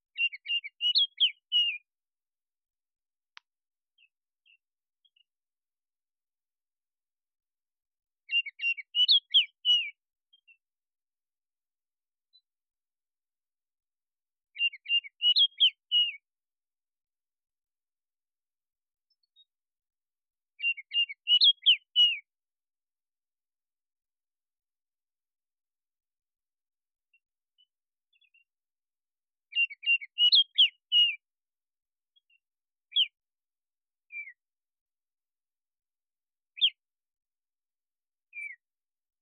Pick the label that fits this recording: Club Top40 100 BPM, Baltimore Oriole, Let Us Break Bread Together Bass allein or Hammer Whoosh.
Baltimore Oriole